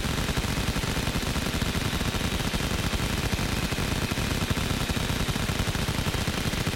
Machine Gun

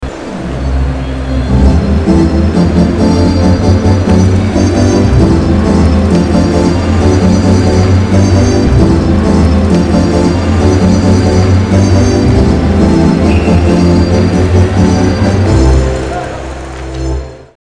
ORGAN; OLYMPIC

